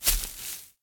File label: grass single step, Footstep; RYK-Sounds